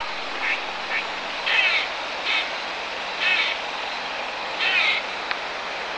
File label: bird